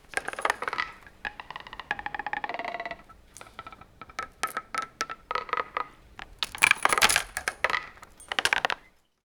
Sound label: wooden puzzle pieces asse, assembly